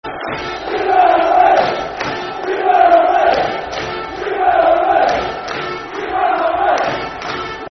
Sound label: Green And White Army, Football Chant (Soccer Song), N. Ireland